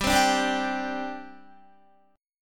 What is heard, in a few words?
G Suspended 4th, Guitar; Gsus4 strummed; 15,15,12,12,14,14